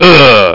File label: UGH